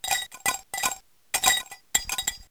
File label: jangle